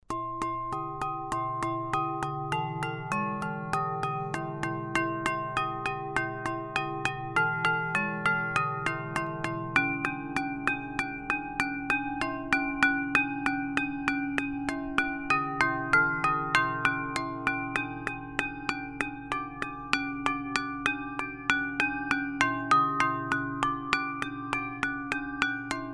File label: Musical Fence; Paul Matisse s